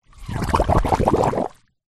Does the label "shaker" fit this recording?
No